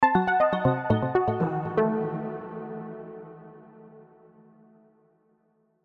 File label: Synth Ascend, Synth Ascend Arpeggio; Musical; Synths; Blastwave; Sound Effects:Musical